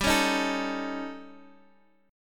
D sharp 7th Flat 5th, G

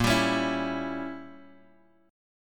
A sharp Diminished 7th, Bass Standard; strummed; JGuitar

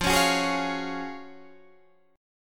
Gb Major 7th Suspended 2nd

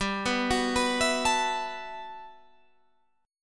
B 7th Suspended 4th G; arpeggiated

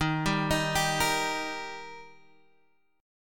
Eb Major Eb down Guitar Standard; arpeggiated

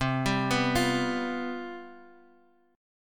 C Major 7th Suspended 4th, C, down, chord

